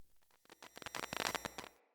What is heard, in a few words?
decoy cardinal static; Objects; Thawtide